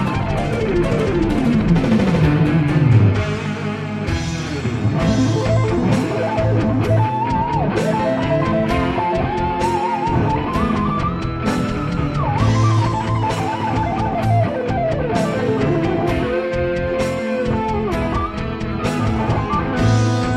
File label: Solo